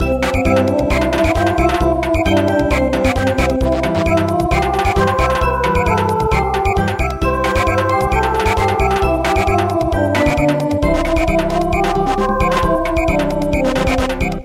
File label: Samba